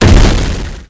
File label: Hit Crate